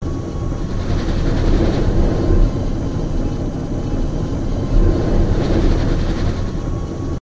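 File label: Diesel Loco Rattle Interior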